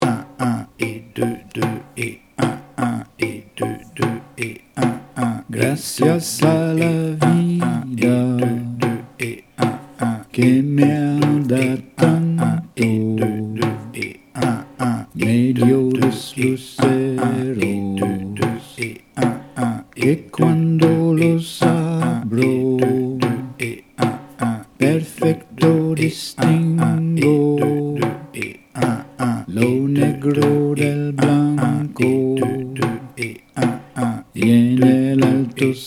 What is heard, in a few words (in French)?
Rythme Joropo